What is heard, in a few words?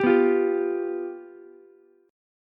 F Suspended 2nd Flat 5th Ukulele, chord; strummed; JGuitar